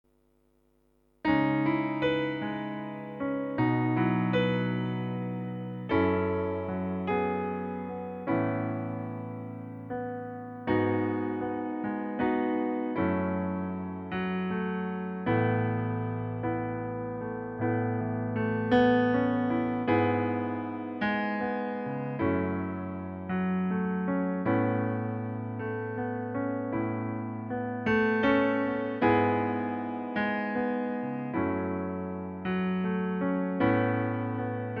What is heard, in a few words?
piano playback; für sänger